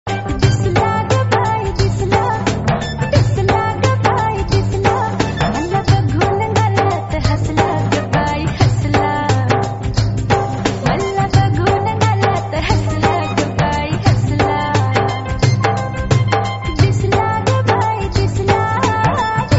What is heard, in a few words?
Marathi Ringtone